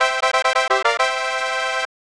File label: Bonus Fanfare; Super Mario World 2 Yoshi's Island; Super Nintendo